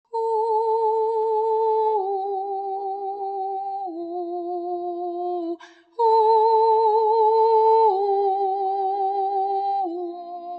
partie 3 voix aigue, chanter entre femmes

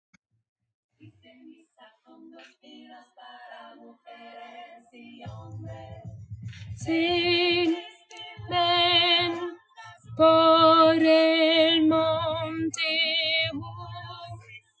Refrain contrechant voix middle

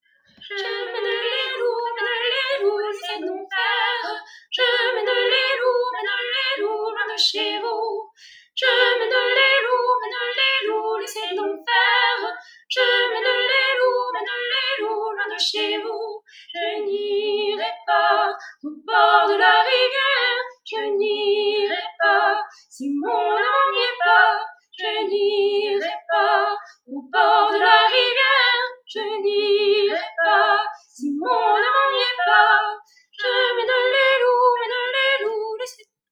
Les loups 2 voix

